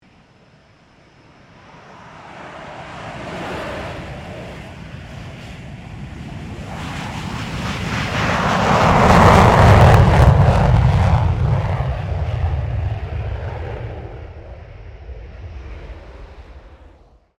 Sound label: AIRCRAFT FA-18 HORNET TAKEOFF STEREO; RAAF FA-18 Hornet; Airplane; Vehicles; Aircraft Collection